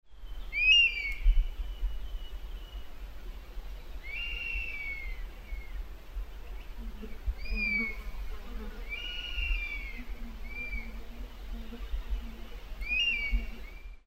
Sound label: honey buzzard; teremiski